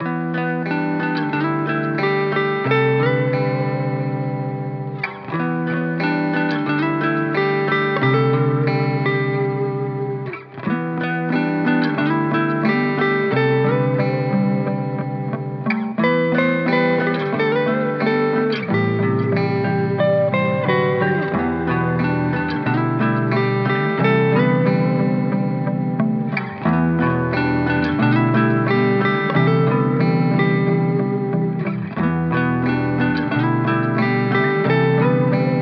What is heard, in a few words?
90 BPM E Min, Guitar; Broken; Cymatics; Miami Guitar Pack - Pt 1